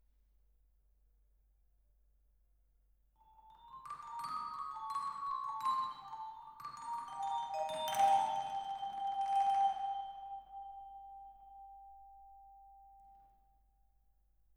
Vibra

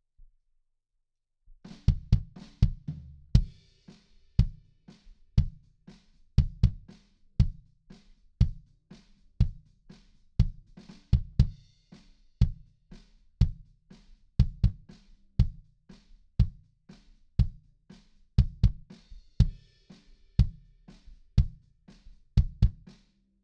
BD IN

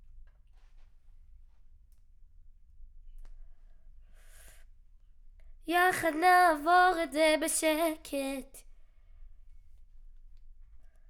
Children Choir; Studio Bekol Children Choir

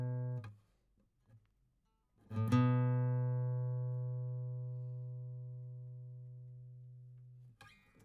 GTR

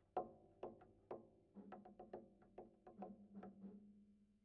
Bass Line, Ragtime

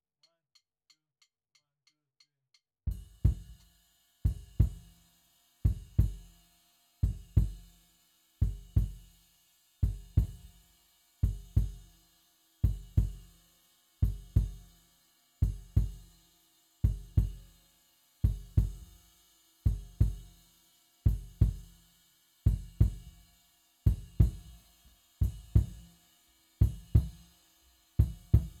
Kick IN

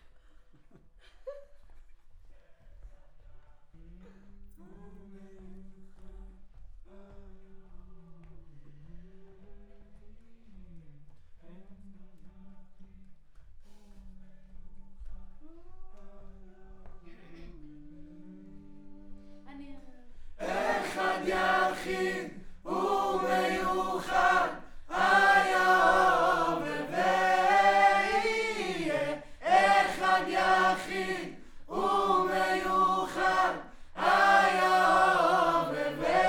Choir

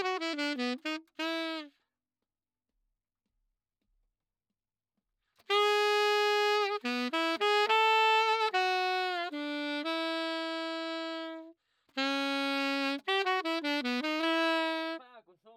Sax

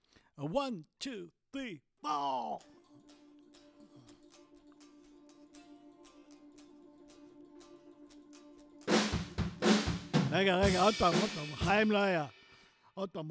VOC

